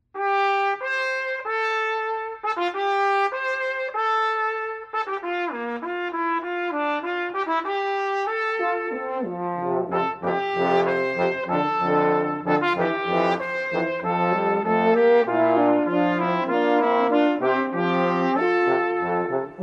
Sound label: Fanfare, trio; Ewazen; Frederic Demers; trio Debonnaire